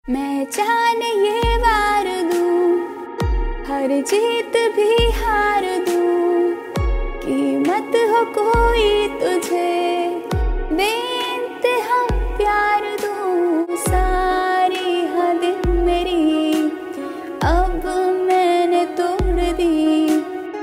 Female Song Ringtone

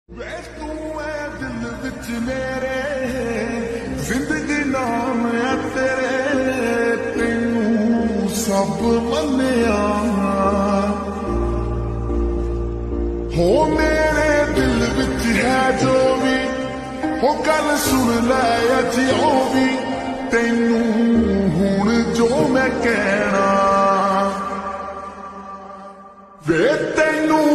Sad Ringtone

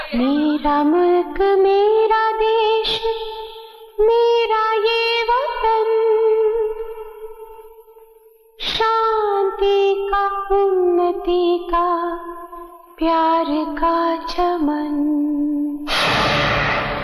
Female Ringtone

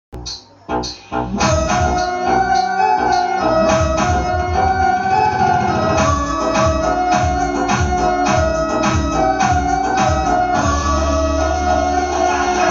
Instrumental

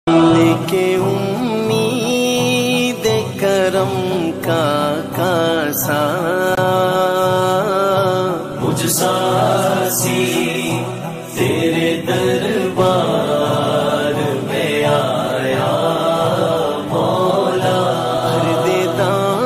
Naat Ringtone

